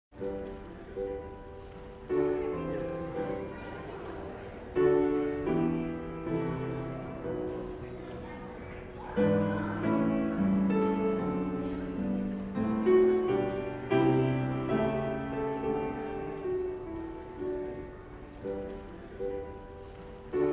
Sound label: piano; Morning Prayer